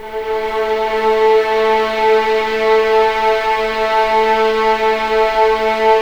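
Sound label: legato violin, The Ultimate String Collection; East West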